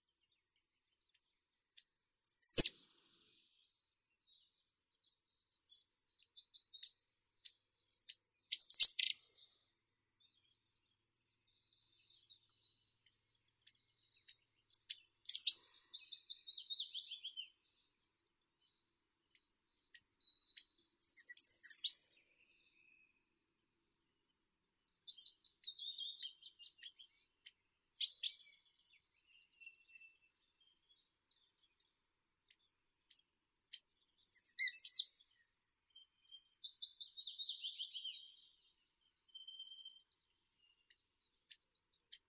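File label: goldcrest, regulus regulus; korolek zheltogolovii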